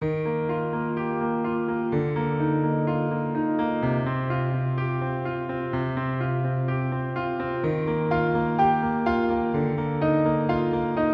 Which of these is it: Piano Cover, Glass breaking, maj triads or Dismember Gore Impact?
Piano Cover